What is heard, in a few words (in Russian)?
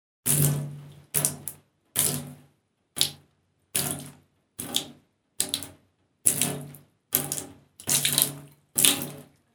plesk vody zvuk